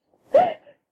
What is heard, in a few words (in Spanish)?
llanto mujer